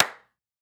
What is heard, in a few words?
Acoustic Clap